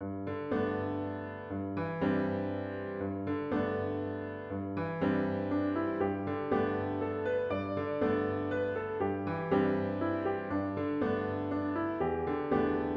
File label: As the Wind Song piano with Intro; worship service